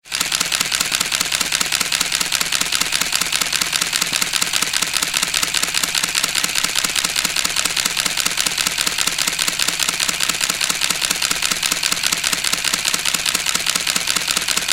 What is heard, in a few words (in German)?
kameramotor; klingelton